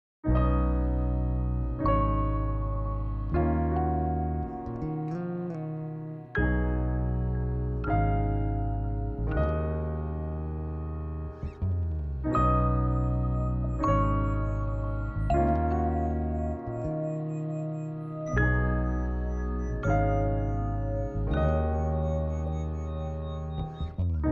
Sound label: First Sight Background Score